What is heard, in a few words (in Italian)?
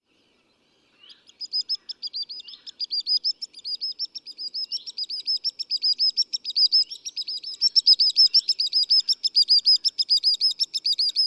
Piro Piro Piccolo